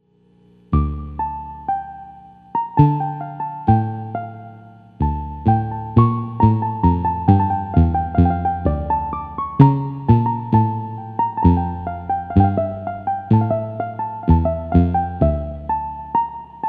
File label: pro piano piano harp clavichord split, Farfisa combo organ; Farfisa Professional